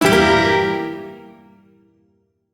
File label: Shawzin Phrygian Chord; Music; Warframe; Duviri; Chords